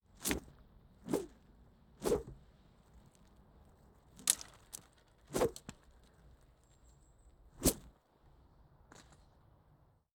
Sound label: Tie Rope